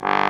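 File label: Trombone, Orchestra Brass, Roland; Club-50 - Foundations Roland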